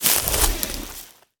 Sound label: Dng Warp2